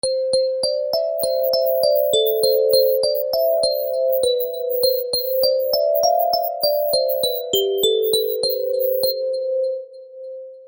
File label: yankee doodle ringtone